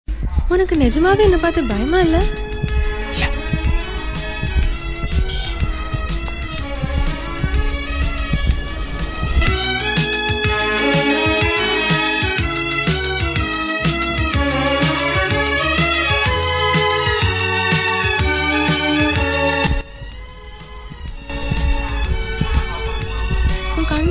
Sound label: bgm; super deluxe